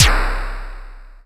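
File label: Pit Snare; Quasimidi Rave-o-lution 309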